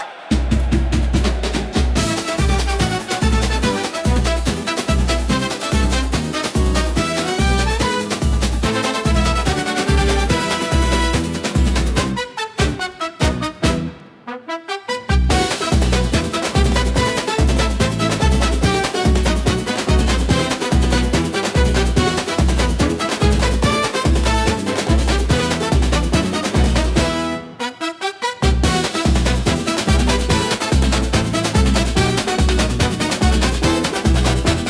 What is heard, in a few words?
Carnival Thunder, upbeat carnival; for festivals and parades